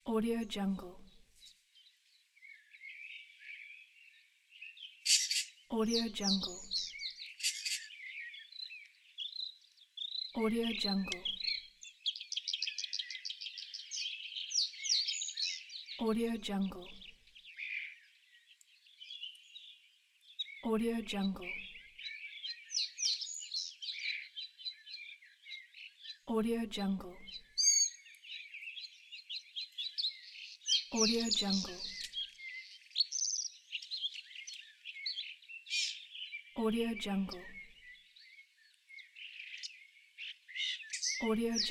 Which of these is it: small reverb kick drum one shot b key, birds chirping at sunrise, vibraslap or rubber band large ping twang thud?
birds chirping at sunrise